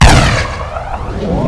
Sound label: railgun; weapons